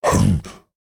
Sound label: Minotaur Attack Vocals